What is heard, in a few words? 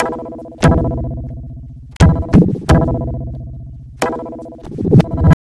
Tabla, 90 BPM; Zero-G; Pure Tabla